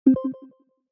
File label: rec start; VoiceInk